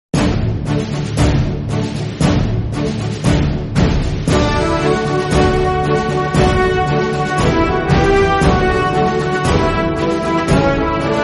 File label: National Anthem Of India Instrumental